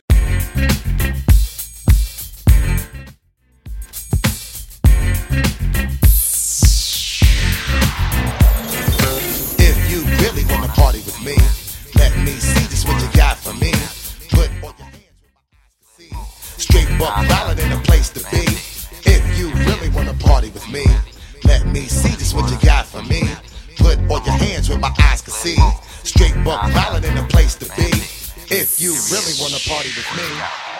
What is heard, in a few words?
Moombahton Bootleg 101 110 Bp; Mainstream VIP; Vegas Edit; 101 110 Bpm